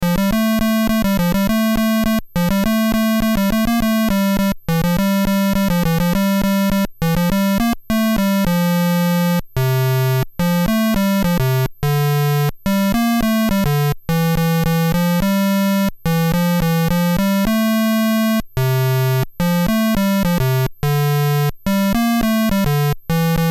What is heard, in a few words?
Golden Slippers, Player Piano, With Hiccups; BASIC Manual